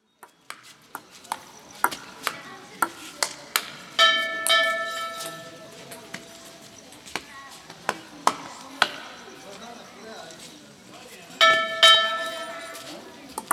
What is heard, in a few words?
ping pong and bells